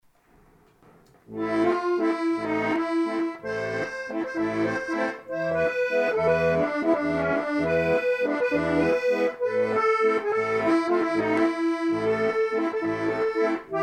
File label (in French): Valse Venezuelienne; Partida